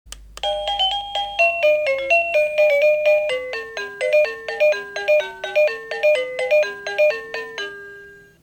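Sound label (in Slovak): Bezdrôtový zvonček melódie ukážka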